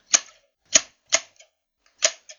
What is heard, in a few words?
clippers; OneShot Fading Memory